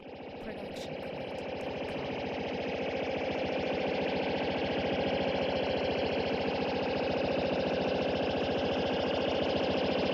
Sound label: anime energy rise very long